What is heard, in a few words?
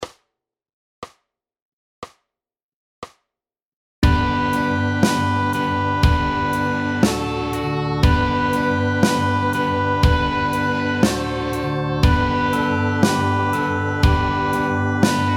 first song drumset